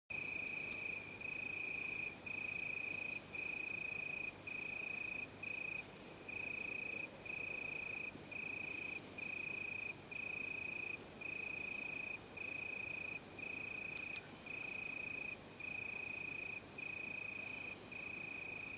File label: Oecanthus pellucens; Weinhähnchen